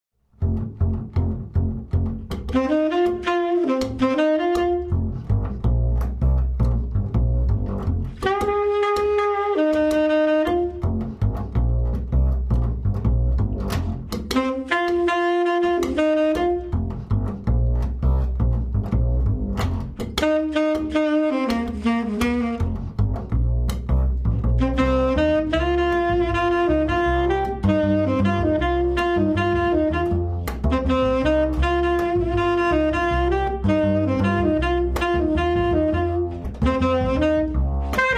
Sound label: unplugged Duo